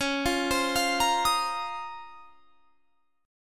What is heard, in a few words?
C sharp Major 13th, chord; C#M13; arpeggiated